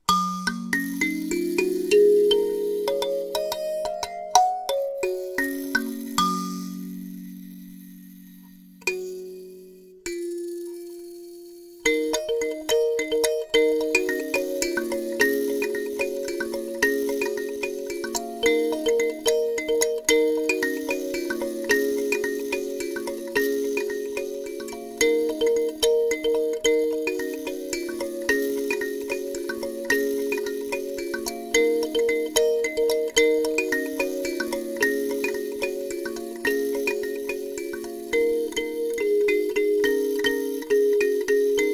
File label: Karimba Box F Sharp 15